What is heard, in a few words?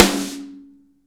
SN, Real Mega Drums; Best Service; Real Mega Drums VOL-1; SN AMBIENTEC